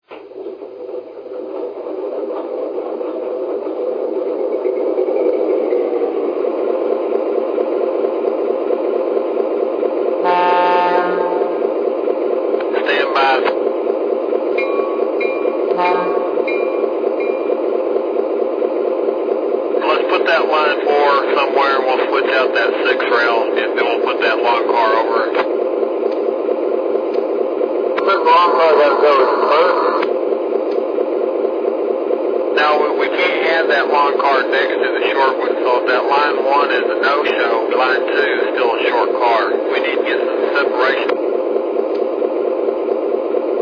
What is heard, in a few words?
NW2, trains